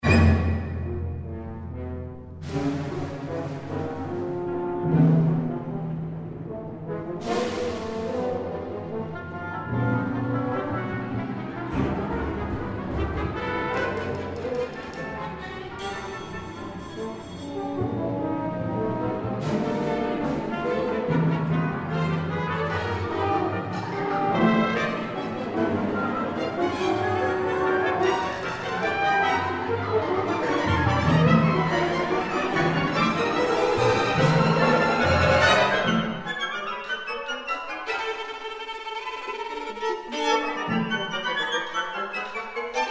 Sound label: VIOLIN CONCERTO